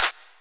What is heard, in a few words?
electro clap